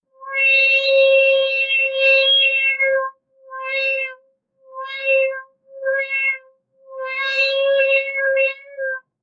robots

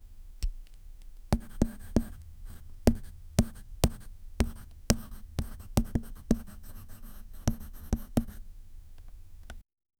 a pen tapping on paper

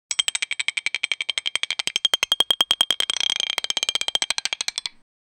the sound of spinning in, games

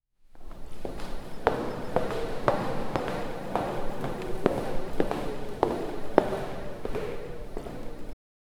the sound of walking down, fashion

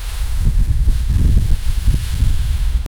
wind through trees; exterior

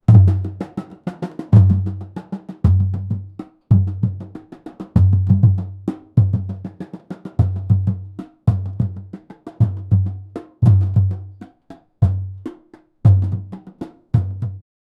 the sound of drums in, africa

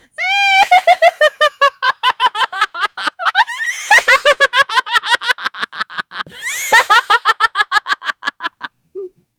male and female laughing; comedy

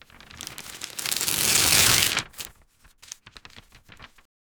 crumple the paper into a

